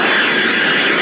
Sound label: jet